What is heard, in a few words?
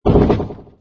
open